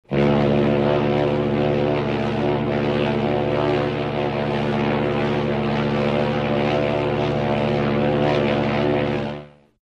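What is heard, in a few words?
motor ship horn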